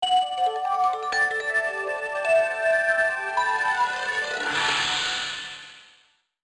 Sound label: startup sound; danger hiptop